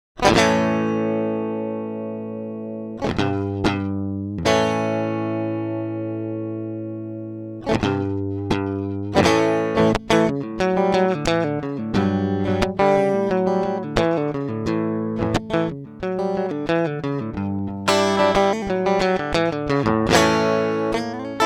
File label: gain dry; guitar; rig